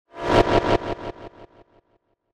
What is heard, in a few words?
Sci Fi Warp Echo, Sci Fi Warp Airy Tubular; Sound Design